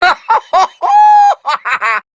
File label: Laugh; Grineer Queens Guards; Battle Barks; Warframe